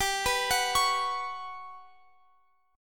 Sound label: G Major 7th Flat 5th G, Guitar Open F; GM7b5 arpeggiated; x,x,19,18,21,20